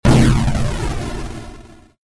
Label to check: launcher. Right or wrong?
Right